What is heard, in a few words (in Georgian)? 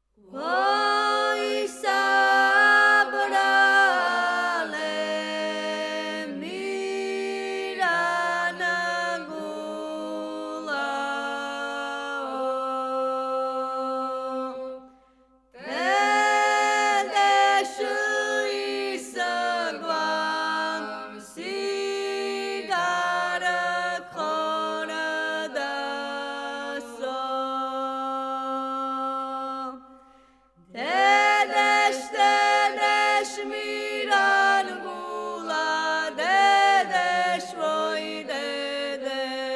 მირანგულა სვანური პირველი ხმა; გალობის უნივერსიტეტი; სასწავლო